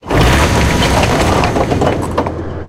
tank engine int load, engines tanks; War Thunder

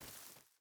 footstep barefoot grass walk; Rust

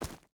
footstep hide gravel run; Rust

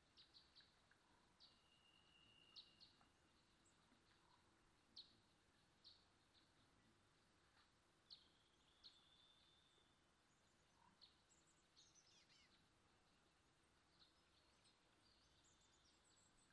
Bird Ambience; Washington; Civ5

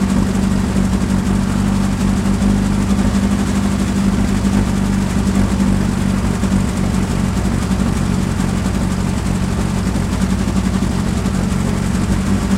m4a3 engine rpm load; War Thunder

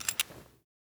revolver open cylinder; Rust

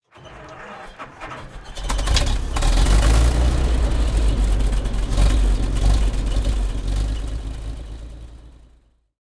m1 engine int start, tanks; War Thunder

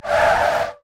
squeak; War Thunder

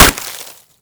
Projectile Sounds; Red Orchestra 41-45

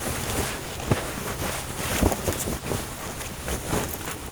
carry wounded loop; Modern Warfare

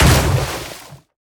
depth bomb; World Of Warships; weapons

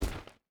footstep rubber boots stones jump land; Rust

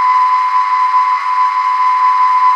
Aircrafts Whistle; DCS

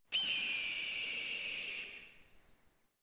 desert hawk; Ambient; Civ4 warlords

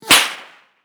bullet flyby rifle; Rust

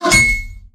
tank hit small interior; tanks; War Thunder

